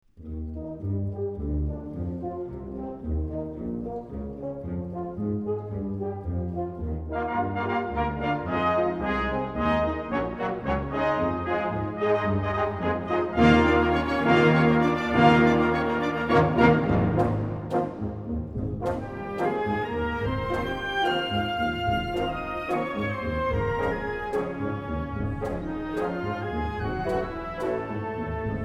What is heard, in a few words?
Symphonic Songs For Band Serenade